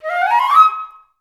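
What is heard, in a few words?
flute run up D#3; Best Service; Peter Siedlaczek's Advanced Orchestra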